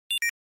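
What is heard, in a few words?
Game Boy General Pitch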